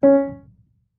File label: marimba piano; ゆめ2っき